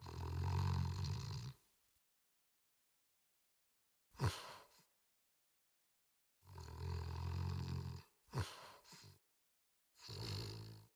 Wake Up Tent Loop Cub Snoring

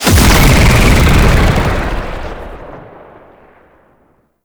Hellfire Burst; Roathe; Warframe; Tau; Abilities

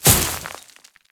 Grineer Step Veg, Footsteps; Enemies; Warframe

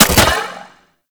Space Drone Bomb Fire; Warframe; Grineer

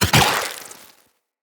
Shot, Weapons; Moa Pet; Cryotra

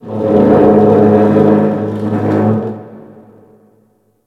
Ice Metal Groan, Ambience; Warframe; Corpus Ice Planet

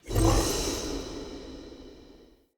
stag idol mode in; Powers; Stag Pact

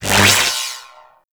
Chain Lightning Rifle Loop End; Warframe; Corpus

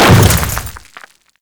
Hammer Boss Step Dirt, footsteps

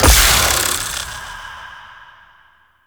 Reaper Deathly Wall Target Hit; Warframe; Wraith

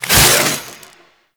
Kuva Lich Finisher Parazon Stab Out; Warframe

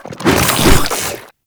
Infested Ancient Strike Flesh; Warframe

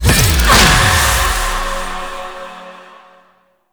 Choir Erupt Cast

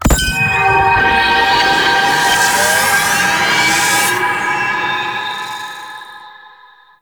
activate tower power stream; Warframe; The New War

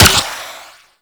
Flare Launch; Archwing

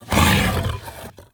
Melee Grunt; Enemies; Vocals; Warframe; TheNewWar; Archon; Amar

